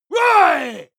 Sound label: male combat attack short